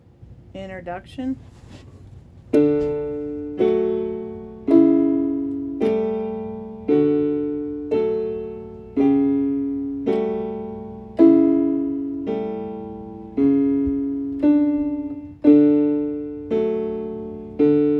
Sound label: Slow trumpet; Trumpet Tune